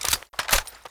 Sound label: UZI reload; submachine gun reload